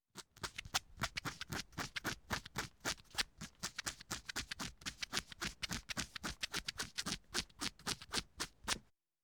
挠牛仔裤; renlei

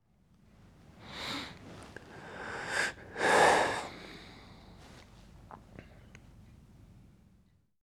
睡眠(叹气)

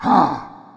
人声激励

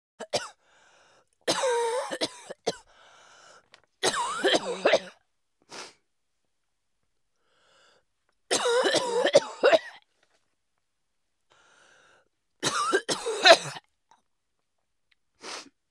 女人咳嗽; renlei

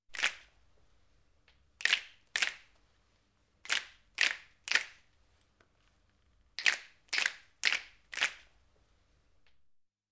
响指(次、多人)

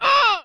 女受伤; renlei

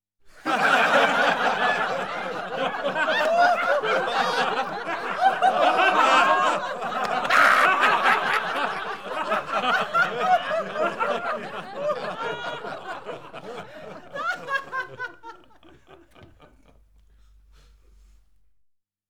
笑声(众人、成年、中等长度)

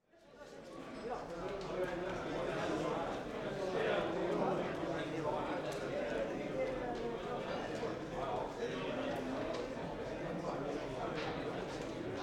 嘈杂声(瑞典语)

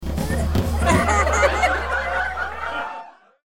婴儿小孩开心笑出声

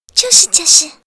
女点头; renlei

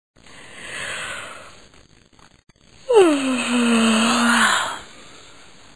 女人打哈欠; renlei

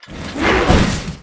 死亡倒地

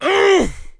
男 受伤; renlei

